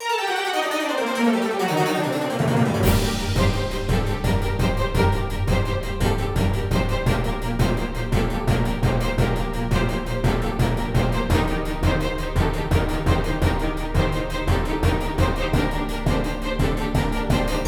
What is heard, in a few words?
BATTLE BOSS LOOP 170bpm; SAGA Complete Collection v2.0; SAGA III - Cinematic Normal & Boss Battles; Elegant Enemy